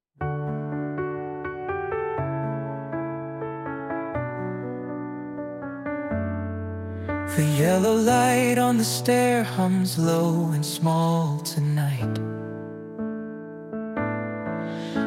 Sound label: sian lullaby